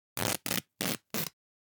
Cloth Split Rip Tear - Slow - Foley Cloth Movement; Hollywood Edge; sound designer's toolkit v2